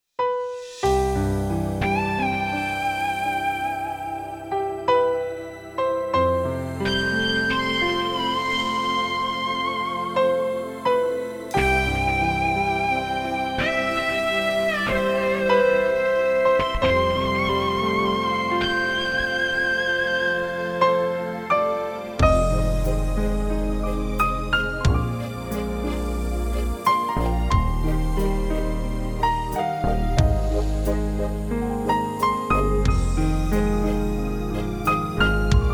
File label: ballad